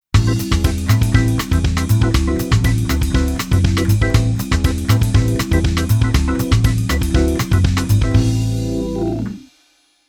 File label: Keyboard Organ Bossa